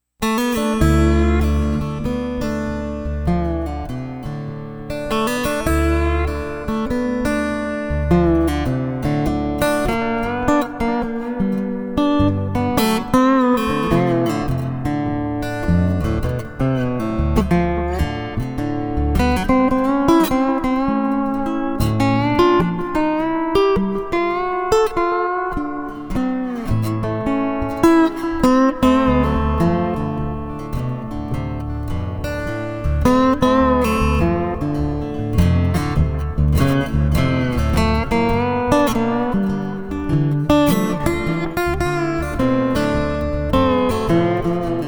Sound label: Hound Dog Blues